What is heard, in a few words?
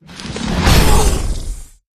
bonus collect all; Recode; rampage riches Desktop